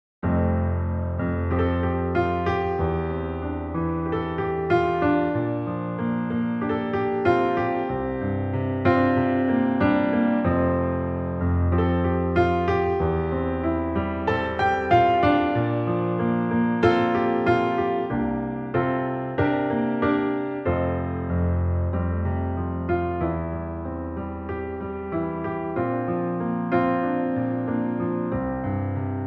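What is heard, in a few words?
piano track F; Pianotrak; key - F - vocal range - F to D; Lovely and intimate piano only arrangement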